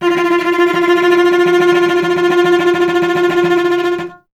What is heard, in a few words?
STR VC F 2, Cello; Miroslav Vitous; Solo Instruments VOL-1